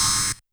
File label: Rev Burst, YAMAHA RM1X